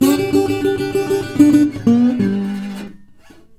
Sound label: 120, Blues Guitar; ILIO - Fretworks